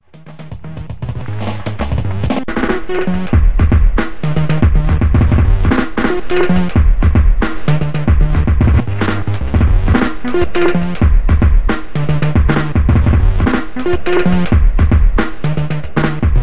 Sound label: dnb; magix